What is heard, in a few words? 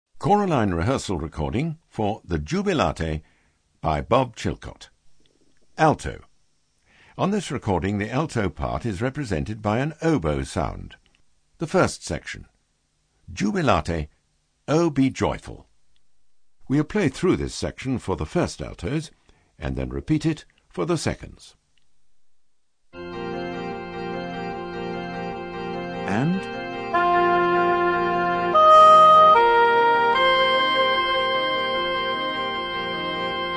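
Alt, alto; Chilcott Jubilate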